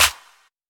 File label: clap single shot e key; single hits one shots